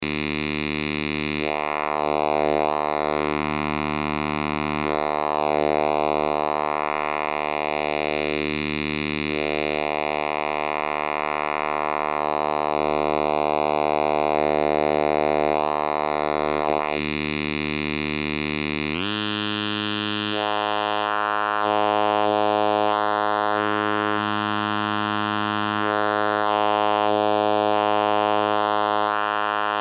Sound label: vocalfilter